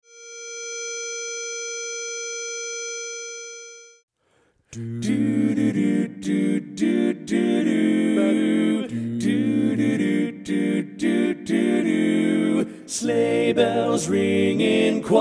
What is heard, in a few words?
Warm And Fuzzy - Baritone Left; Christmas Music